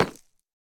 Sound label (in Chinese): 深板岩砖 踩踏声